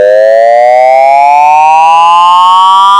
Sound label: Boop - Audible Test